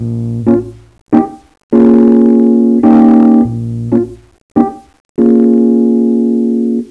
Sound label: Keyboard, Jazz; Sonic Foundry; Crimson Blue and Fabulous Horncraft 4 RnB; OntheJazzTip